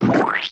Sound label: Button Press